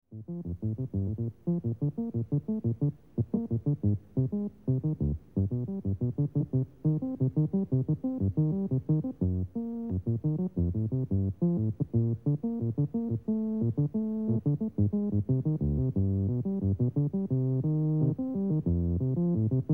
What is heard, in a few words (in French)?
ligne basse